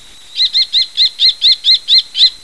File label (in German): turmfalke